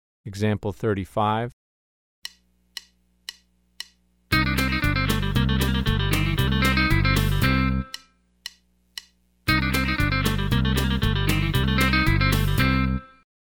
Country Rock

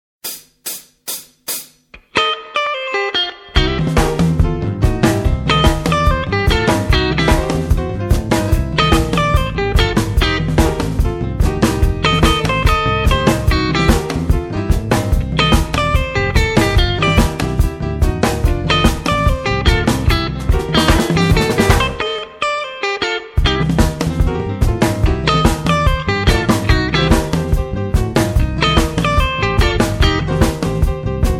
blues jazz

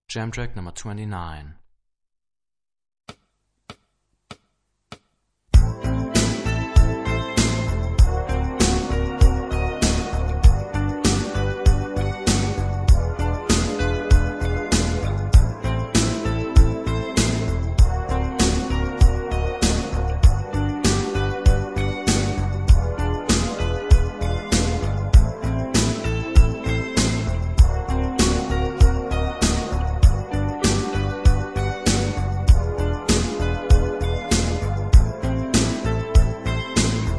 Rock guitar; Rock gt Secrets

